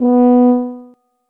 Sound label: tuba note